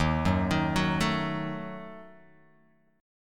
Eb Diminished, Guitar, Open F; Ebdim arpeggiated; 3,1,3,1,0,x; JGuitar

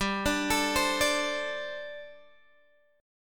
G Suspended 2nd Suspended 4th, Guitar Open G

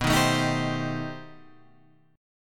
B 7th Sharp 5th Flat 9th, guitar; B7#5b9 Chord {7 6 7 8 8 8} strummed

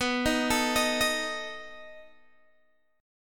B 7th B down Guitar Open D

